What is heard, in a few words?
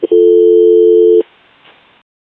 phone notification